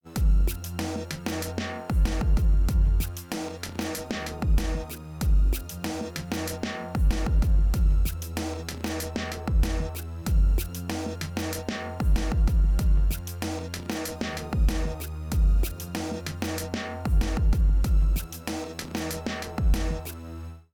beat avec crete ratio 36 atk fast crushed

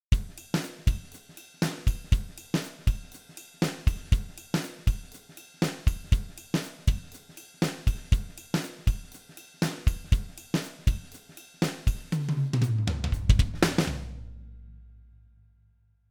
batterie sans; comp670

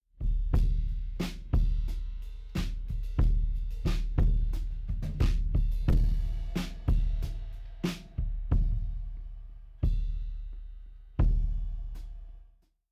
kick avec fat

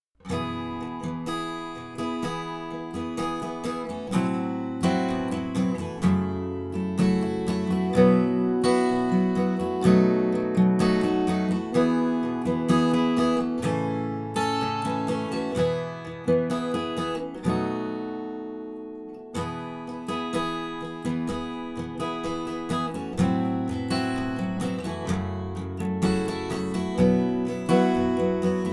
guitare; overloud otd 2

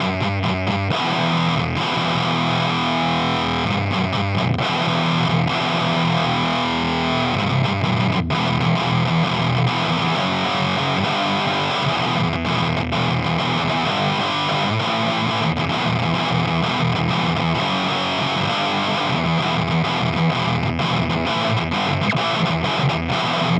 guitare avec; heavypedal

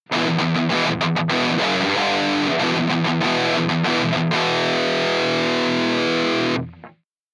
guitare sans eq

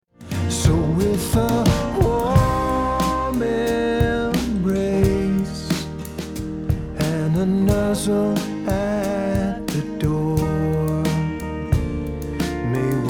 mixage avec tape 7 1/2 ips

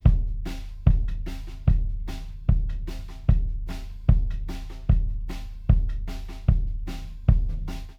kick bass apres

